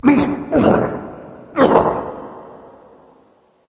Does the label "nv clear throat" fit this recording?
Yes